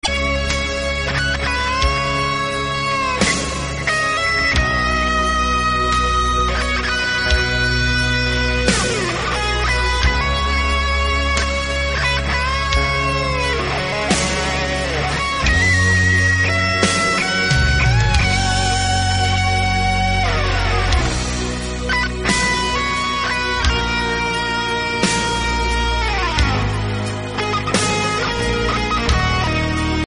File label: Angel Guitar